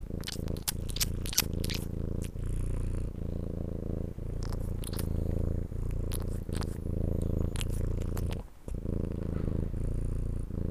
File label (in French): ronronnement